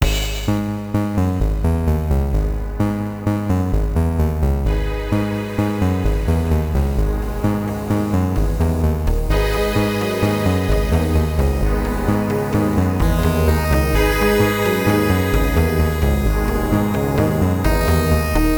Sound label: Smooth Intro; high strangeness; wii u